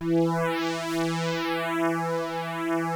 KORG, Rare Synthesizer; Hollywood Edge - Giorgio Moroder Rare Synthesizer Collection

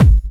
BD; Best Service; Dance Mega Drums

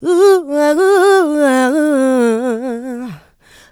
Vocals, Adlibs; Houseworx

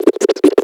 CHIPMUNK RAP, Vinyl Scratches

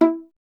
STR VLA, Solo Instruments; Miroslav Vitous; Solo Instruments VOL-1

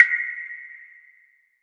snare woodverb; Sonic Foundry (Sony Creative Software); Ugly Remnants VOL-2; Beatstuff

